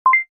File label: Beep Sound